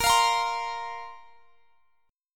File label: A sharp Suspended 2nd down; A#sus2 strummed